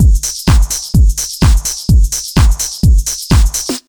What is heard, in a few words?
127 BEAT, Fresh Disco House; AKAI; USB Soundscan vol.20 - Fresh Disco House I